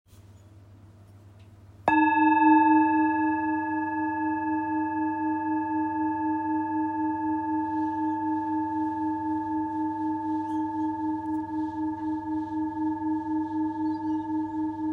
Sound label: bowl, singing bowl; 16cm; 15cm; Polished Harmony Bowls